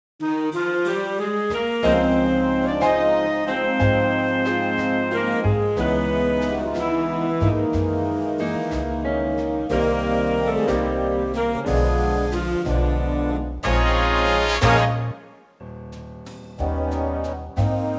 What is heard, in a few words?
Ab Big Band